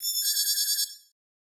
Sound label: Enemy Alarm Attack; Robobeat